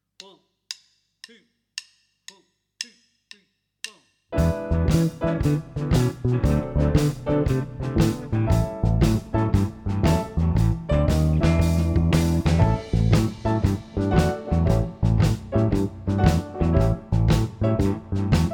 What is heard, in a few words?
Shuffle 116bpm in A No Guitar; The Jam Track; rhythm counting